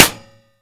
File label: Projectile Sounds; Red Orchestra Ostfront 41-45